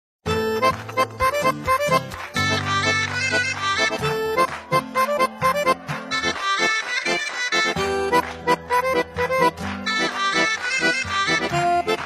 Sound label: Bollywood movie ringtone; tubelight 2017